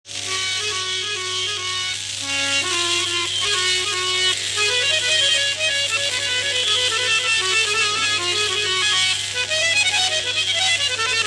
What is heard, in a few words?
harmonium